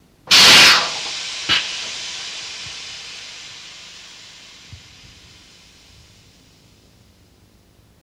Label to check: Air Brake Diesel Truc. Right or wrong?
Right